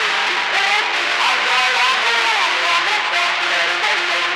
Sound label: Synth Distorted; Electrocution